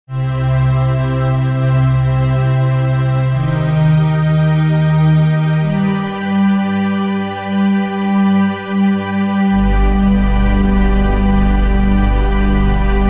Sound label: Waldorf Quantum